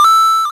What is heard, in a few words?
beep; soundbox